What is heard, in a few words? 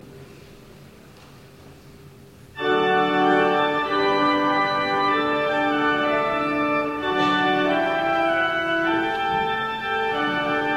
Trumpet Tune